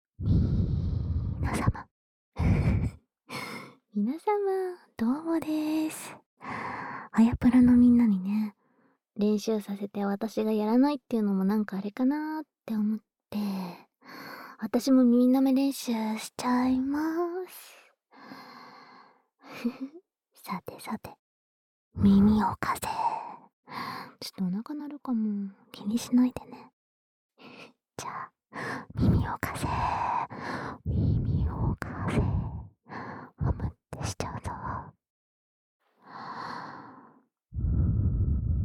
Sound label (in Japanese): 耳舐め練習, ASMR